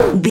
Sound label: retro impact hit